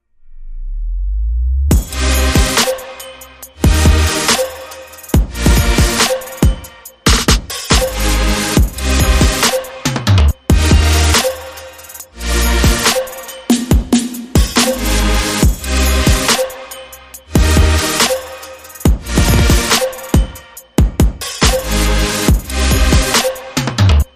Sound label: Future Bass